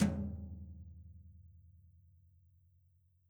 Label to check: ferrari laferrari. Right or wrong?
Wrong